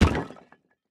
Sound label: build concrete large; Factorio